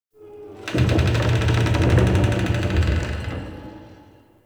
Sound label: Wooden Creak; Warframe; Ambience; Nineteen Ninety Nine